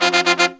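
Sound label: Pop & Funk Brass, 110 F, left; Best Service ProSamples vol.25; AKAI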